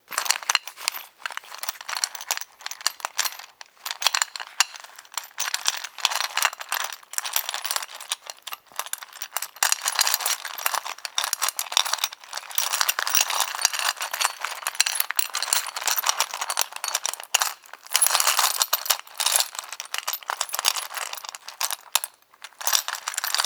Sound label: Nuts and Bolts, Texture; Loops; Ableton Live 12 Lite; Core Library